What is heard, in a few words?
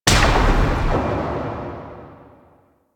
Shield Smash Ground; Warframe; Tyl Regor; Axe And Shield